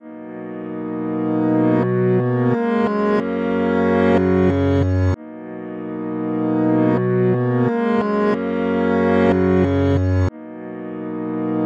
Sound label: Reversed Piano